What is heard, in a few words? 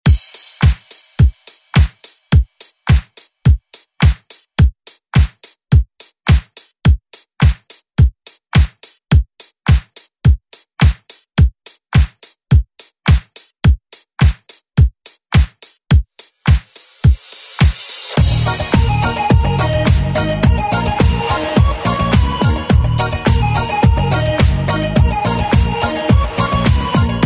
90s ReDrum